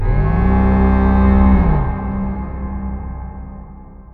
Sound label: raaaaaaaaaaaaaaaaaaawr